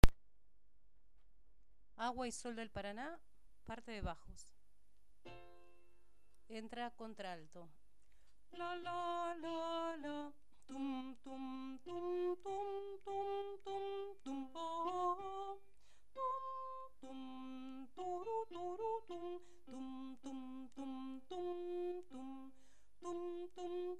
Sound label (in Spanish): Bajos, MIDI